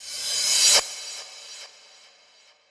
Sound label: soft hit whistle; osu